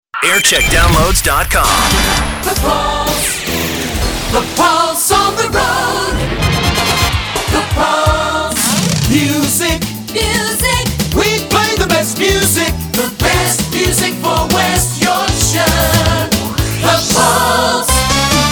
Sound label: jingle montage; pulse; 1995